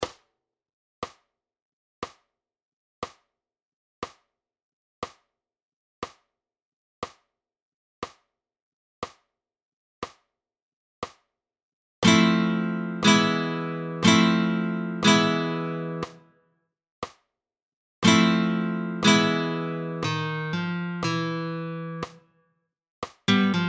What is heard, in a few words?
Alzapua